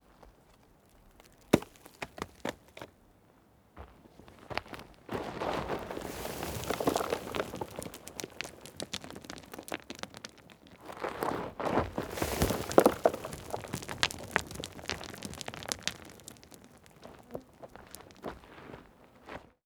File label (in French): sons foisonnants